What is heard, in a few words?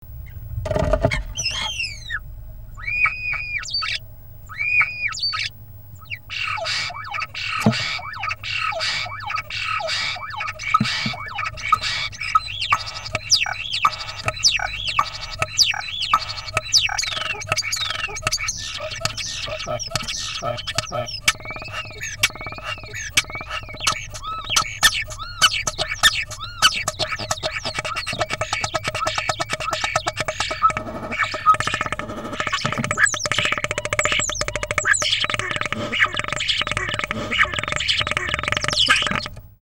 starling